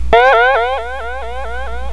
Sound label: Lose; TotRecal